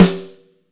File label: sonor snare